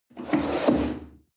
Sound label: chair wood slide on floor hard bump